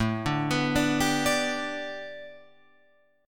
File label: A 7th Suspended 2nd Suspended 4th A Guitar Open F, chord; arpeggiated